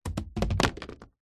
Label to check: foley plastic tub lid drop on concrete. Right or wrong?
Right